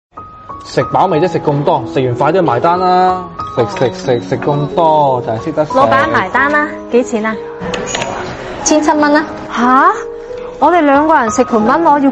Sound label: laugh; breadradio